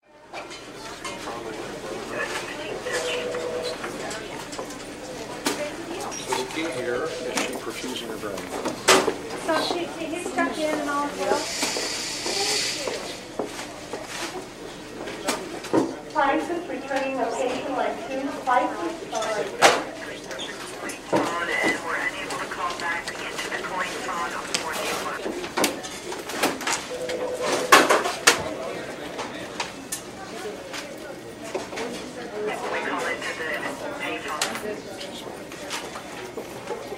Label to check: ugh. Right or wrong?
Wrong